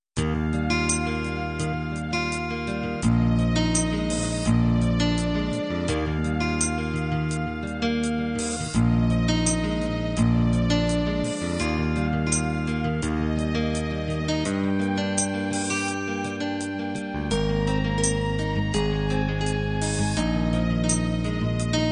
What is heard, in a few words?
guitar ballad; gentle, 16th note, arpeggiated ballad styles for Rock, Alternative, Country and Pop music